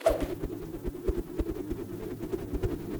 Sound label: Throw Loop; adams venture origins